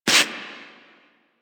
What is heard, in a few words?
Impact Generic; Warframe; Caliber Chicks minigame; weapons; hit effects